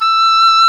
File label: DOUBLE REEDS; OMI - Universe of Sounds